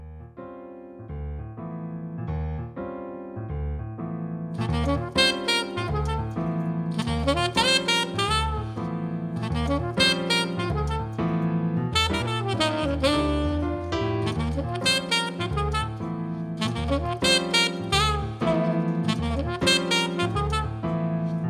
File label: jazz band